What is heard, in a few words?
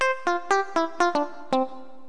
game over; Geschicklichkeit; Pac Man Adventures 3D